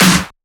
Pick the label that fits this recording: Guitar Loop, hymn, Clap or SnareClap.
SnareClap